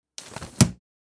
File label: Small umbrella open